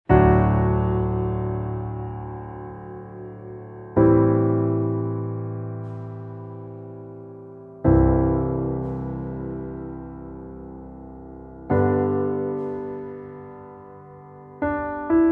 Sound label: Underscore PIANO; Worship Flow